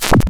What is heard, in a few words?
Morse Coder Static Wobble; Cwejman S1; DevSnd Cwejman S1 - JK